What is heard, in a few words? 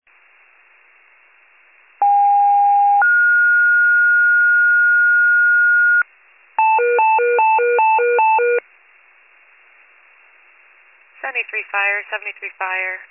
FIRE pager alert